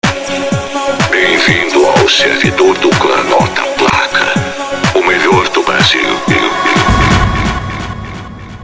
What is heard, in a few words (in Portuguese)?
vinheta anota a placa; cstrike